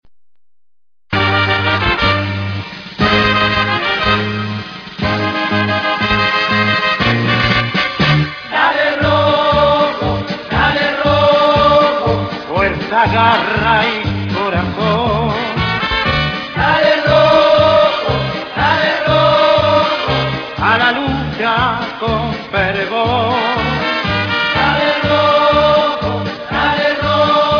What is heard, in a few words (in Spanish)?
marcha